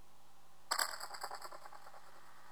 vibrat

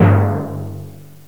Timpani G; TG300B

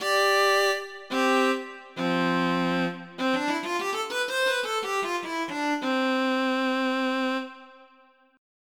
strng; 16 Bit Digital Sound Masterpiece

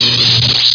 lt saber hit; MOTS

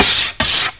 grind; Take 2 v1.0

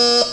Buzzer

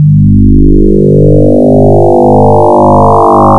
analog

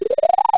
Conveyor; Treasure Galaxy (1994); Learning Company

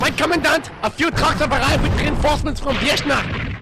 Axis Reinforcements; Empires; D-Day

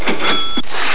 CASH

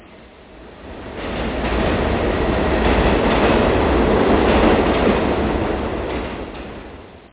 subway

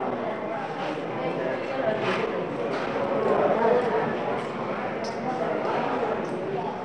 Ambi, indoor; Atennis

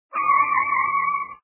Screech; screamin upstreamin

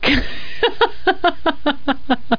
laugh f; Casio Colordesk Slides and Sound 1997

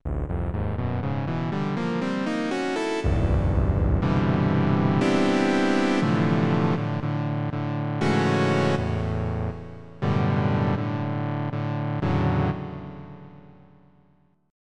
5th Saw Wave; EverQuest

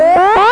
Siren Car alarm; Babylon 5

